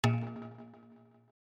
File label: error; NVDA; Random NVDA Sound Scheme Version 2